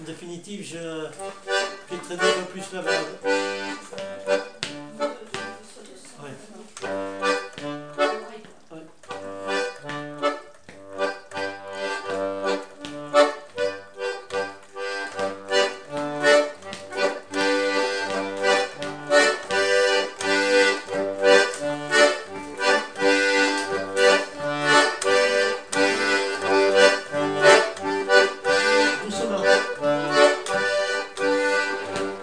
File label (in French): rythmique